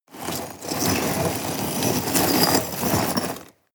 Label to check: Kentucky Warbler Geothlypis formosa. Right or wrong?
Wrong